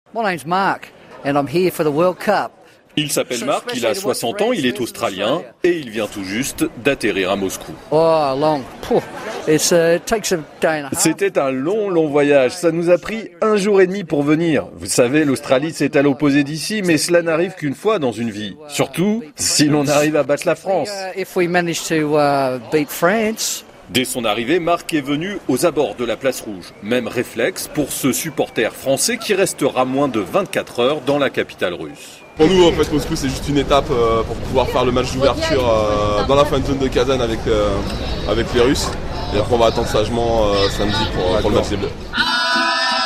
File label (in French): ambiance Place Rouge; rfi; francais; SPORT RUSSIE; Foot CM